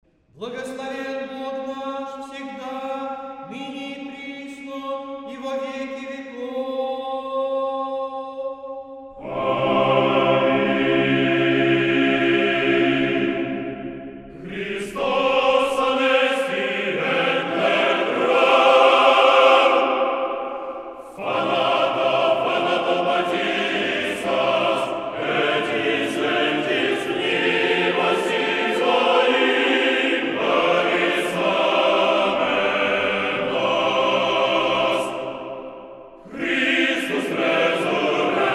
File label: Christ is Risen in Greek, Latin, Slavonic